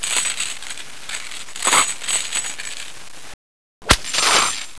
chain brk; XMEN2

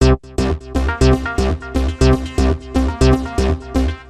120bpm, Sound Loops

